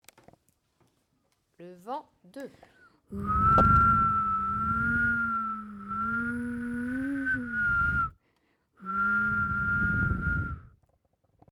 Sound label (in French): le vent